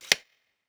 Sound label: Measure Perc; Cymatics; PHARAOH Beta Pack; Pharaoh Premium Drum Samples (BETA); Percussion